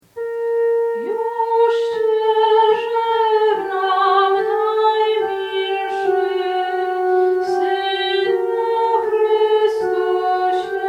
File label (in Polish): soprany